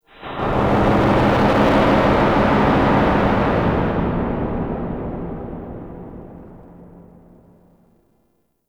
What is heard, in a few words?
Modular Texture; Ambience; Warframe; Shadowgrapher